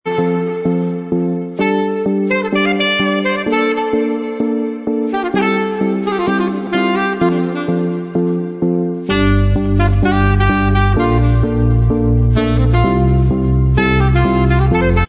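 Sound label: instrumental track, backing tracks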